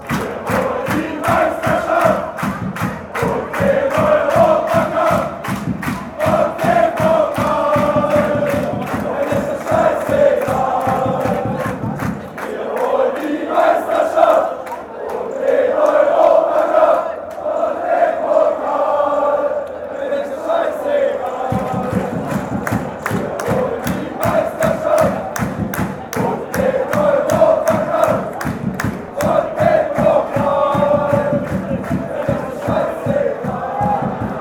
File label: hertha chant